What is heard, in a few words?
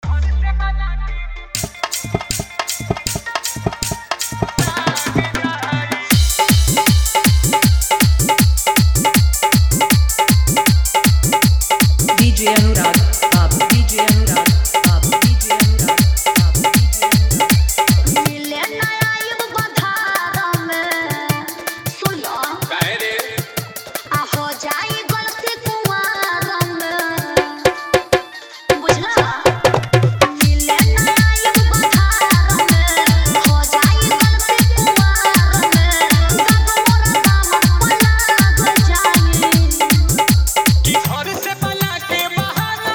Bhojpuri Full Dhollki Bass Mix; Marikpur